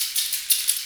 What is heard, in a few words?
metal jangle, perc fx; Roland LCDP14 Africa VOL-2